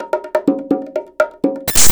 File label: percussion loop 125, bongoloops; house; PS-28 Vocal House Samples